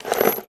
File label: Nails Box Empty; Tools